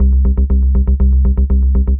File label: disco pick bass